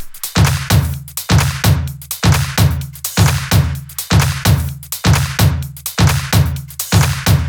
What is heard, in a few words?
Loops 128BPM, Drumloops